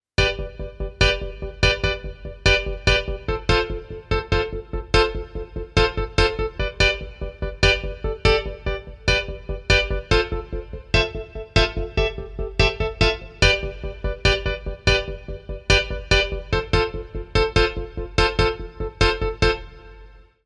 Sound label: Arturia V Collection X CP70 Power CP